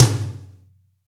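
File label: Room Tom, Roland R8 MKII drums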